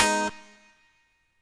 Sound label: Guitar